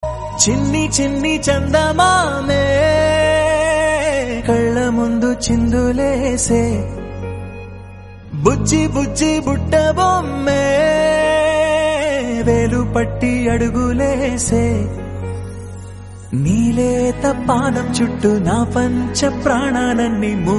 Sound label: Telugu Birthday Song